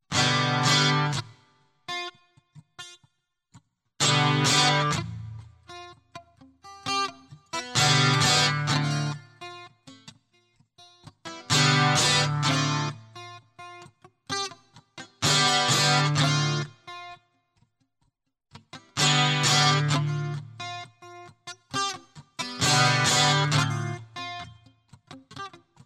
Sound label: Guitar Loop